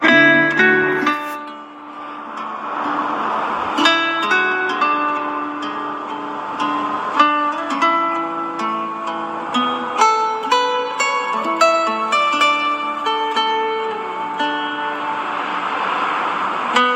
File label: Samsung Original Tone